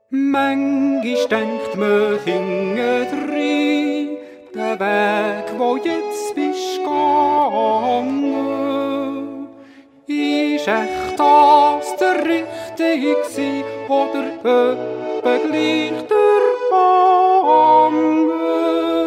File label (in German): Einzel